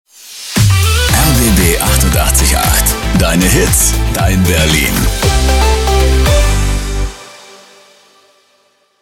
Jingle Short Instrumental

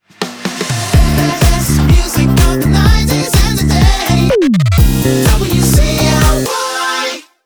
125bpm Slogan; jinglepacks

